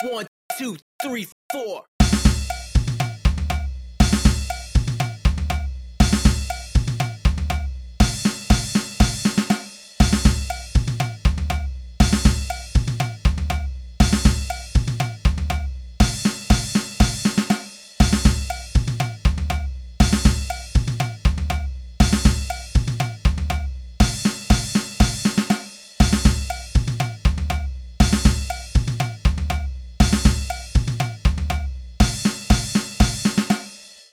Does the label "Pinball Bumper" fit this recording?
No